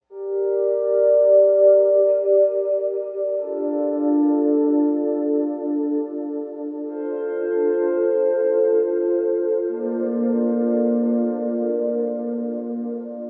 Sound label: volume pedal; diy volume pedal